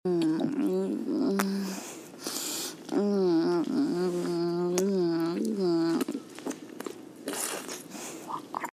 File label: suck, moans; The Witcher Hunt